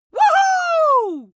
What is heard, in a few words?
wuhu big excitement male short